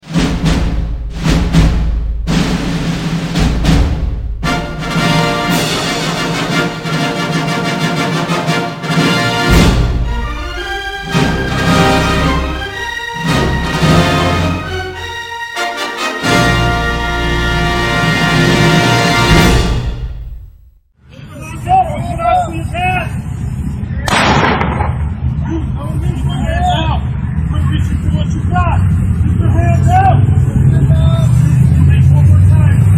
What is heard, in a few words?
Beats And Sirens